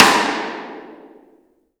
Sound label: rim; Best Service; Real Mega Drums VOL-1